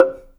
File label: GATO; InVision Interactive; Percussion Slam